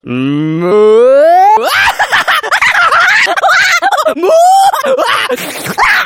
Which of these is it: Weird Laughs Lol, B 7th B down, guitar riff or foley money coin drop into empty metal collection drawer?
Weird Laughs Lol